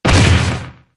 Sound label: thud